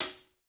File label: Rock hihat; Reference Beat